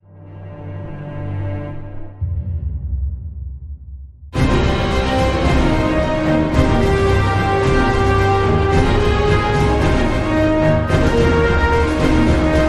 No Choir

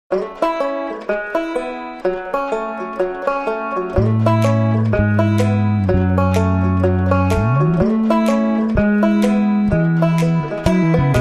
Alt Banjo

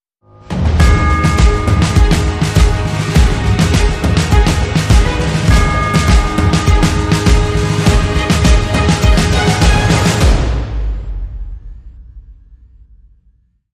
Hybrid Sting

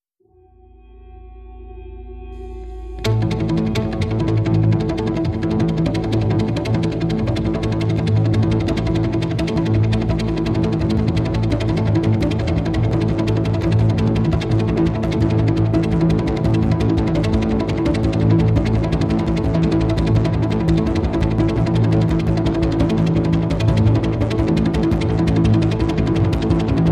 Bed Mix

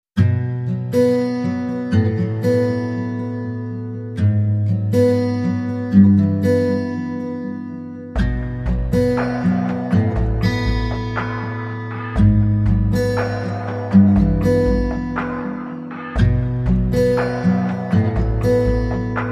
Bed

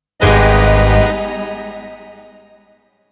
Option Tango

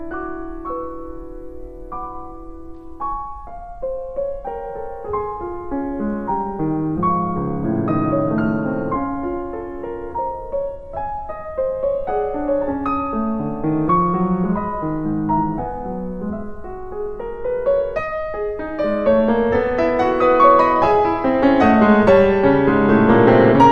Nocturne

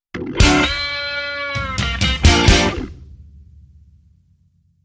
Guitar Break

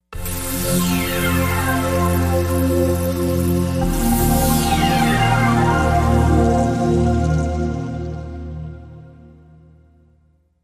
Future TV Logo

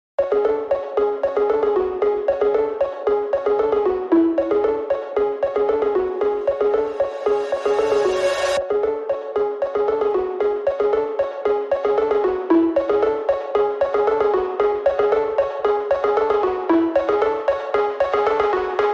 Sped Up, Phonk Cowbell Only

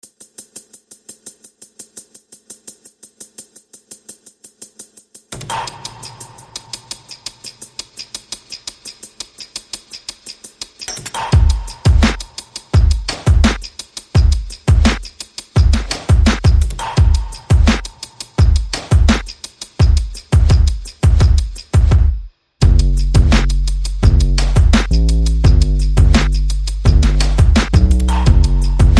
Percussion Bass and Effects

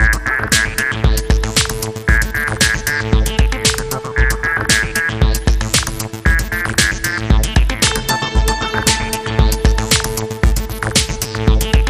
Pulsing

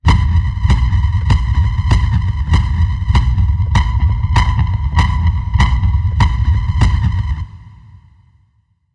Alien Heartbeat

